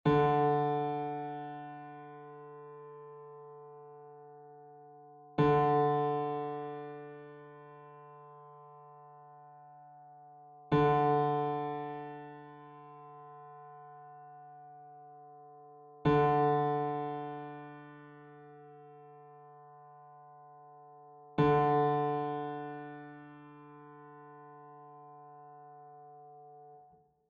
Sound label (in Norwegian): stemmetoner for gitar d strengen d3